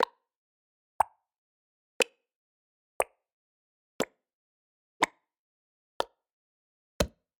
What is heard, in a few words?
Clay Pop Various Basic Ui; Ui click; Funky Audio